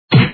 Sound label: Good Punch; Dad 'n Me